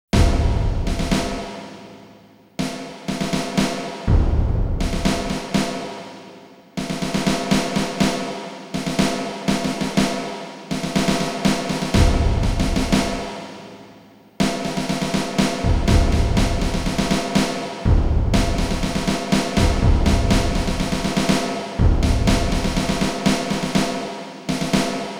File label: A Tense Atmosphere